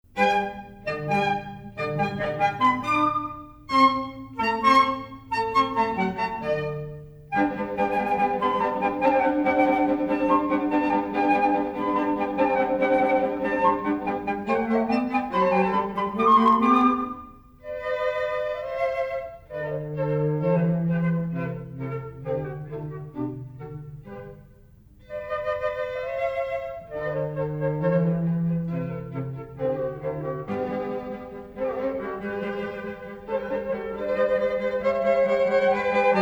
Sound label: Flute, Violin, Cello